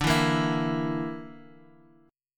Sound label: D Suspended 2nd Sharp 5th D Guitar Half Step Down